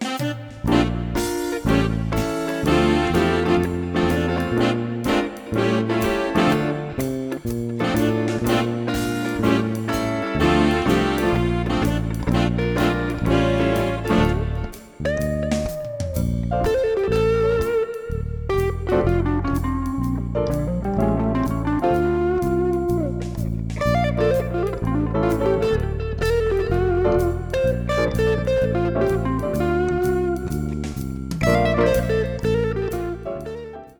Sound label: Blues